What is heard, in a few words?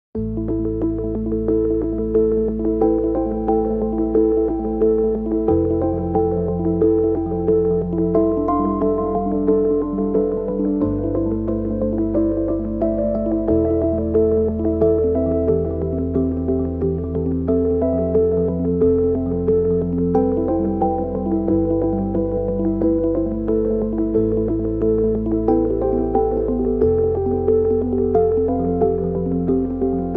Synths and Bass